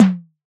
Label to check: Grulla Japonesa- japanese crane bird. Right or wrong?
Wrong